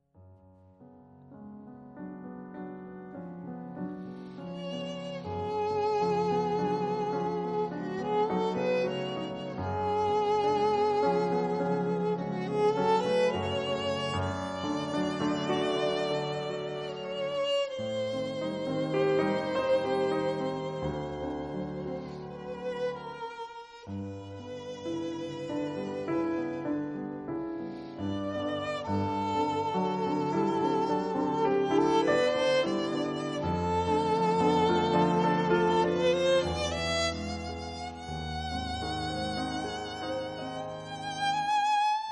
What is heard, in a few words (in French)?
Sonate violon piano; Sonate, violon et piano; 3. Modéré, très à l'aise, au gré de l'interprète